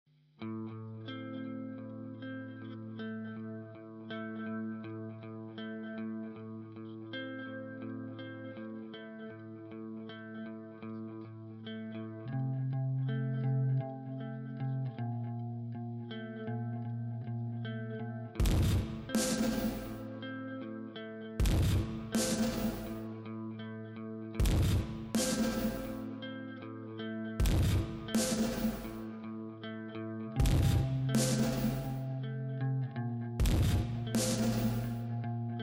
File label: instrumental